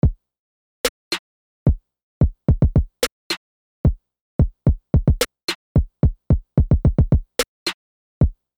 drums

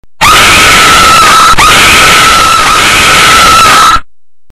scary cry

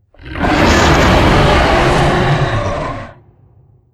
dragon roar

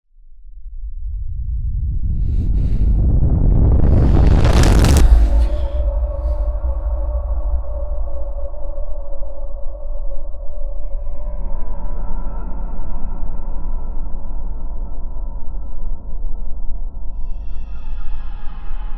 player intro music

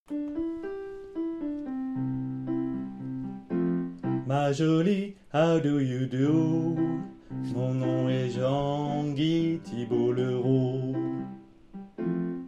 louise sop

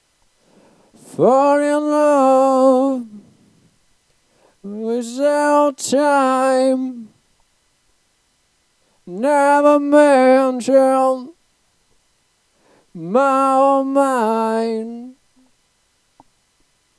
echo echo

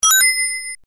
ring sound effect; sonic

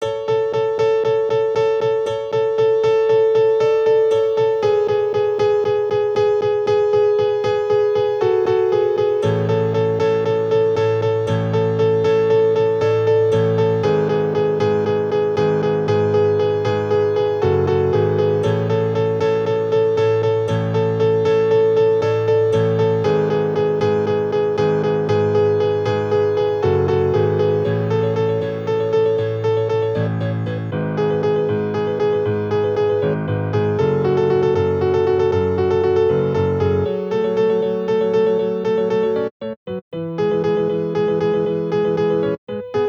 piano

